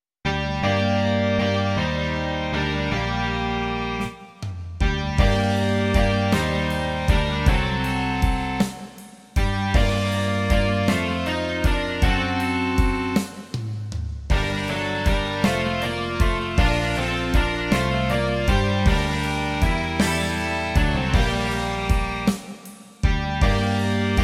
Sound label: rock